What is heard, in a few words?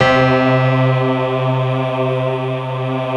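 C 2, PIANO CHOIR; Best Service; Advanced Media Trax Modern Composer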